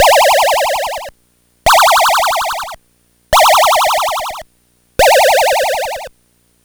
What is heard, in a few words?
Game Boy Synth